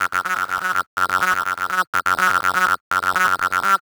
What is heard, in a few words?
acid pebbles F# 124, synth loop; Synth Loops; Zero-G; Soundclash Nu Dance Electro; Acidized; 124bpm